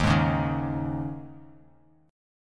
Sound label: D sharp 7th Suspended 4th; D#7sus4 strummed; JGuitar